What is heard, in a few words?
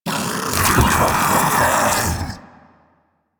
Splitter Join; Warframe; Infested; Grey Strain